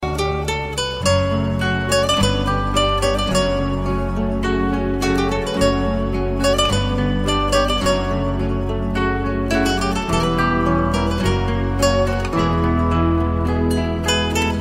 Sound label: so calm, ringtones